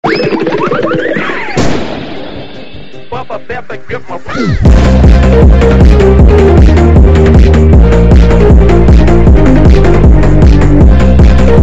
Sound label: bad piggies phonk bass boosted